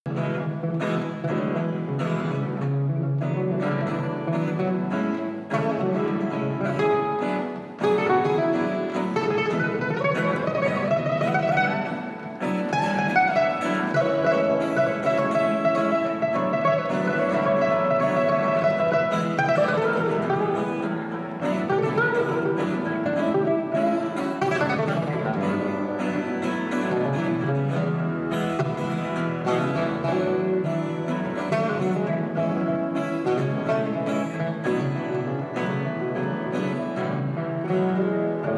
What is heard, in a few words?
jingle